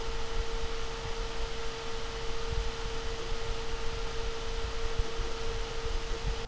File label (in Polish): Gainward 8800GS, koszmarnie glosny 8800GS